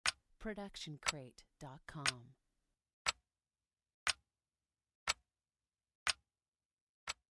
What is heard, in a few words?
Mechanic Clock Ticking; soundscrate